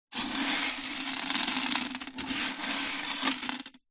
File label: fish tank aquarium no water gravel movements; animals